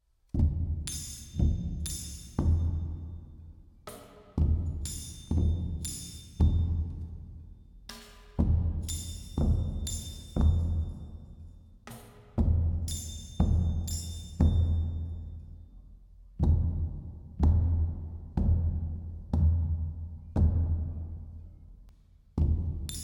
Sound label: Renaissance Exploration; Ara History Untold; Eras Soundtrack